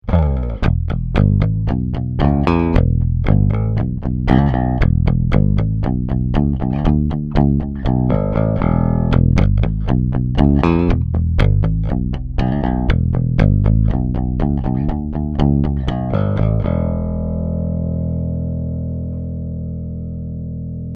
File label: pick parallel